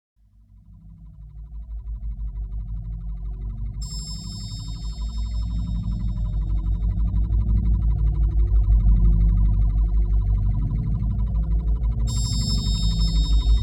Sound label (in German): Sample & Holds, Bass (Zahnräder); Bizarre Planet